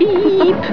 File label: Beep